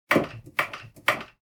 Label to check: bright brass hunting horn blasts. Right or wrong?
Wrong